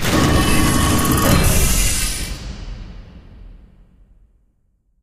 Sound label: pincer wall reveal down; Source Engine; Portal 2